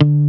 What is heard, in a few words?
D2, Jazz Gtr Plus